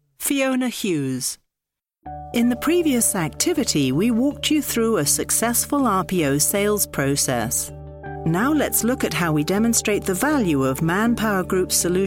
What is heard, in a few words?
montage corporate